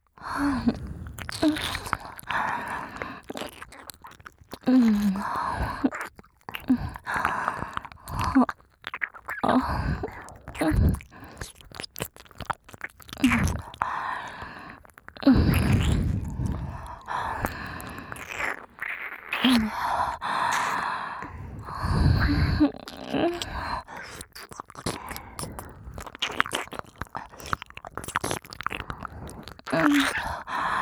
左右同時耳責め; ぐっぽり

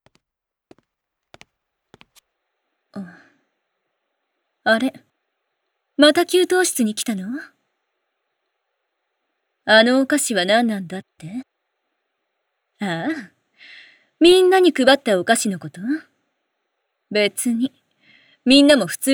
耳舐めフェラチオ; 嫉妬OL 特典1

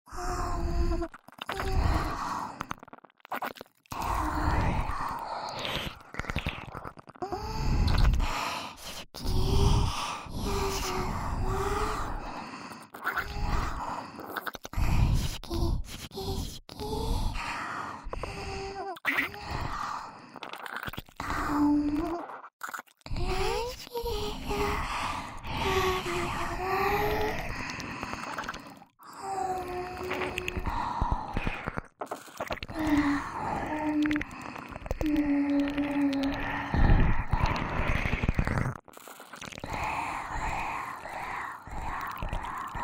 シンクロ囁き耳舐め

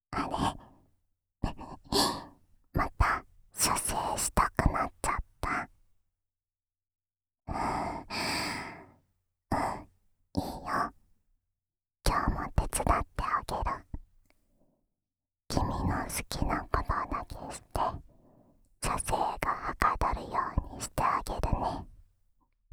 オナサポ用耳舐めループ（右耳）, ASMR; 特典 ダンジョン最奥の禁断の魔女は、あなたのおち○ちんを離さない!～命助けたんだから、たくさん精子ちょーだい?

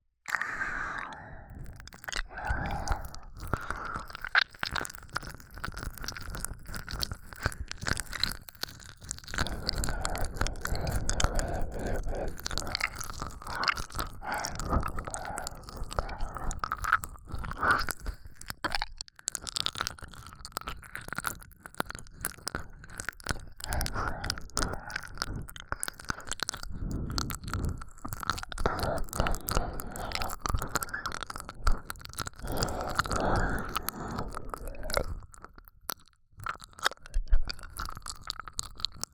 耳舐め 右耳, ASMR; NTRかのんちゃん; バイノーラル・ハイレゾ; メスガキ妹が寝取られ耳元報告で鬱射精させてくれる-魔法少女かのん

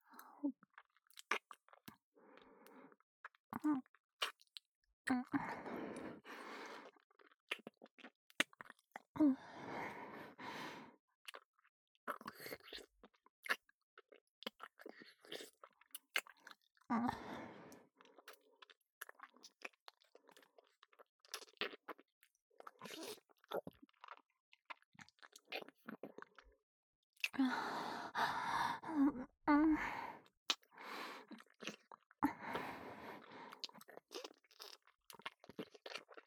キス, ASMR; アドリブ集

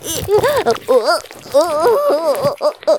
絶頂, ASMR; おまけ(絶頂+潮吹き+中出し ランダム再生)

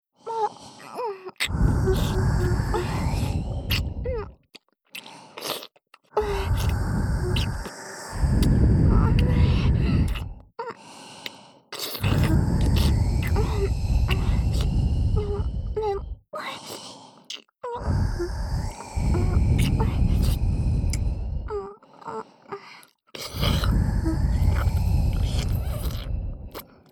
ディープキス しながら 右耳 ふーふー; オナサポおまけトラック; 【不純愛】実の娘に脅されて子作りエッチしてます; KU100

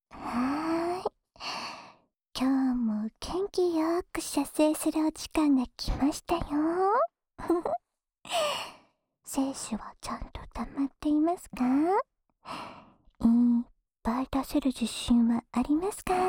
オナニー応援耳舐めループ（右耳）

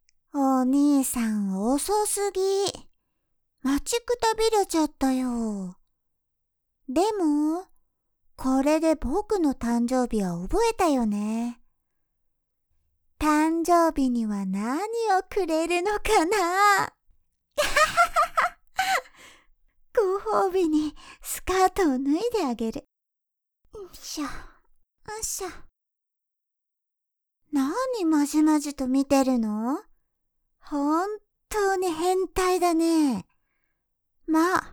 フェラ

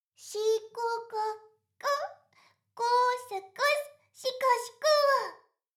しこしこ; 全妹計画２; メスガキ妹; 全妹計画<オール・シスターズ> 2妹め

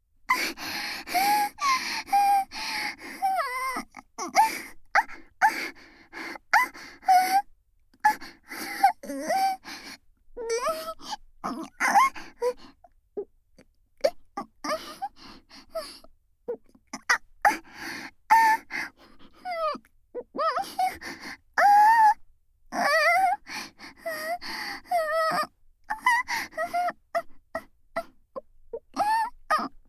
限界突破声出しエロループトラック, ASMR; 萌声

